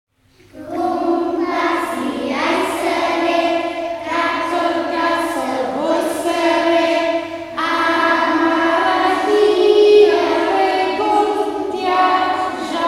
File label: sleep bird song, asian songbook